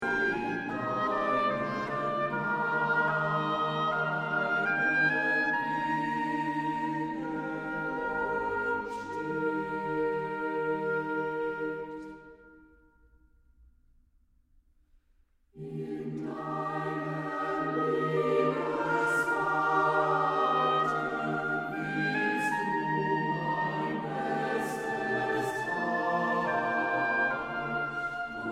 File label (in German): chor; Gott ist die Liebe